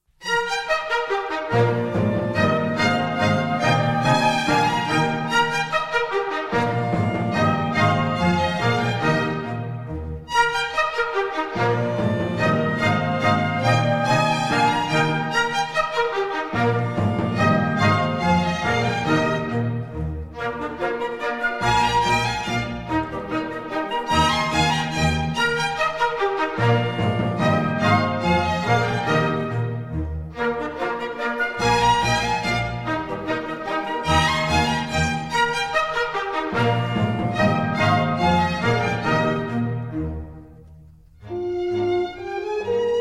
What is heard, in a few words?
German Dances, Eb Major; Mozart; Dances and Marches